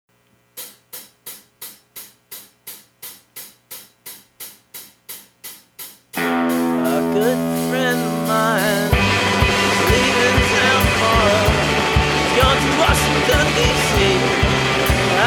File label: Karaoke